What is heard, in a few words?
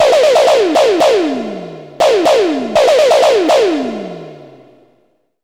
Cheezy Drums